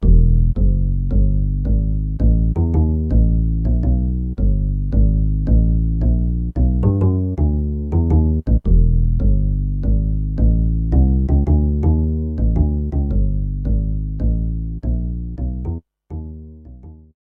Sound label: Ac Bass